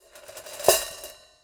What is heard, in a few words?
Prepared Drums